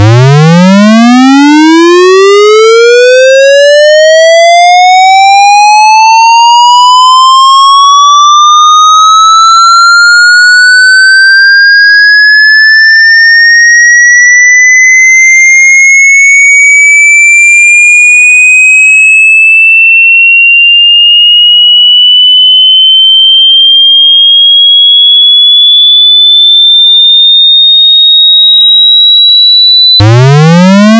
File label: squarewave sweep mono